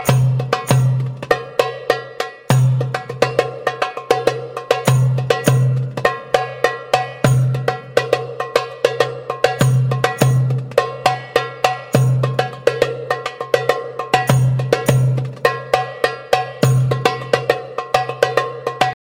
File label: Masmoudi